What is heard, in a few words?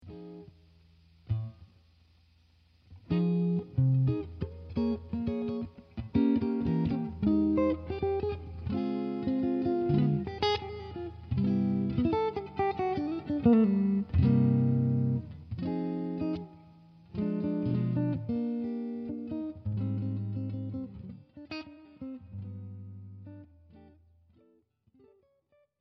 jazz, guitars